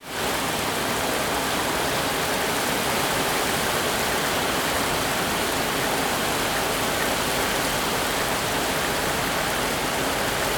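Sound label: town torrential rain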